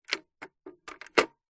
household telephone vintage rotary style pick up then hang up receiver gently